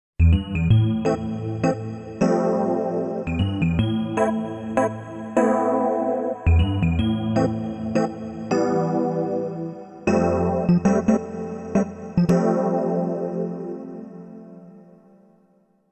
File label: Ballad